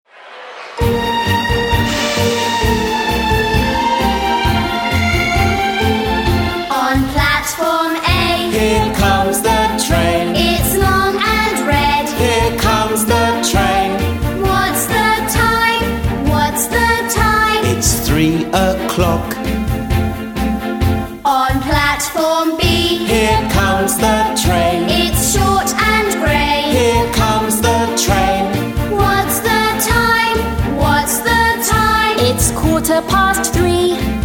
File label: karaoke